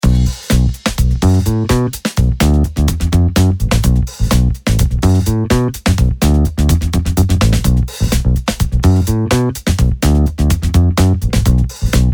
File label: vintage finger bass